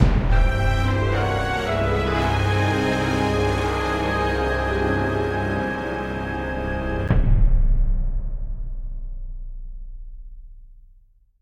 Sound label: Success Sting; Jurassic Park The Game